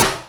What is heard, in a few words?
RAP SHOT